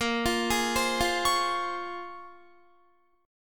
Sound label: Bb Minor 9th Bb, Guitar; Bbm9 arpeggiated